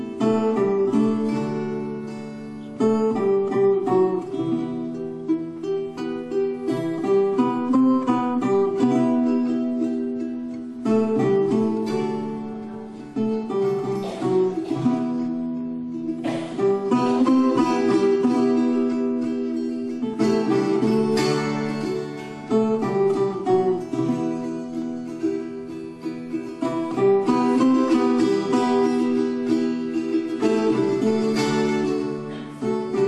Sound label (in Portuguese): Viola; Beiroa; Gualaxo do Norte: a escuta estrangeira